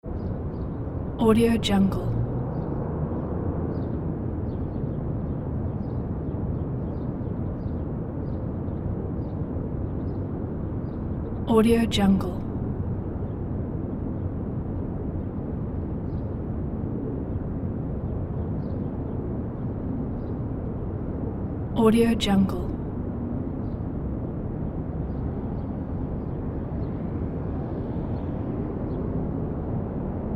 big room room tone

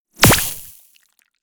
future weapons venomspitter mark ii hit; future weapons 3; soundmorph

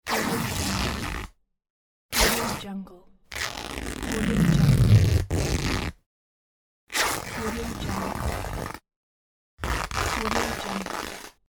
tearing clothes; mrsoundfx

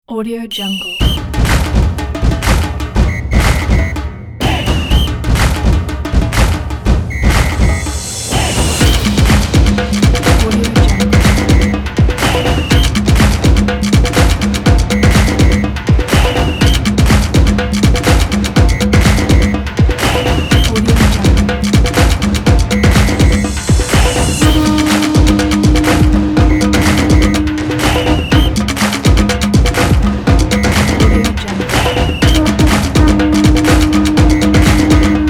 football soccer fan; copa; deepdivemusic

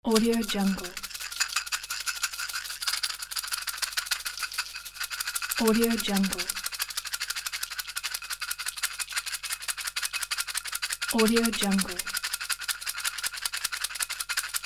wooden toy shaker

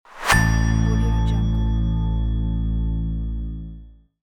dark bell ding

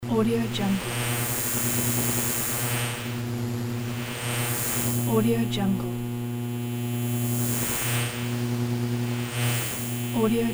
tv static

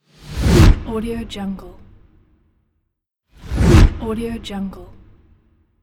harsh swoosh

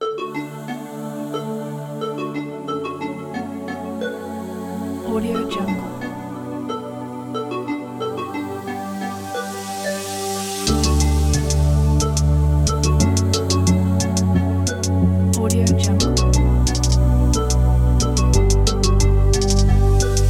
calm beat

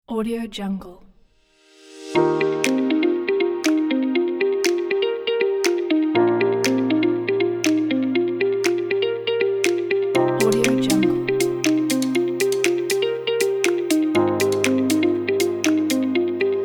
upbeat corporate music pack

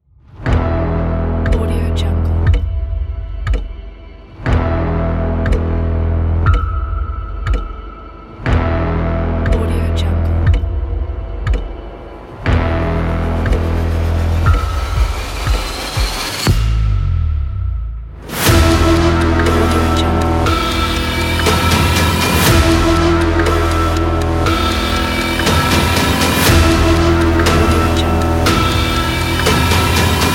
dark countdown trailer pack

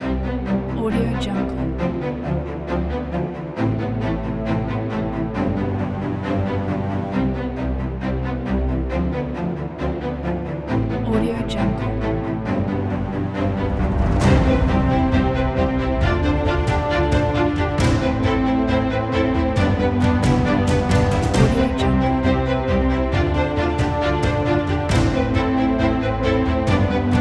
uplifting cinematic strings

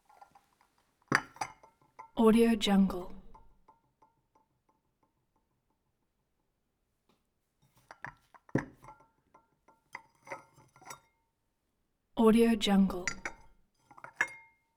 dinner fancy tea cup movement

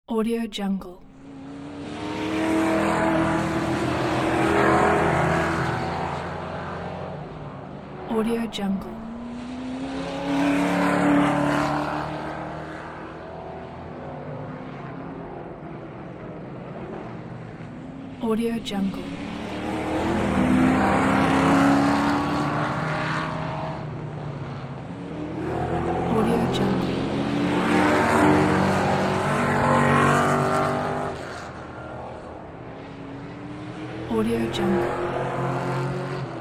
racing cars pass by

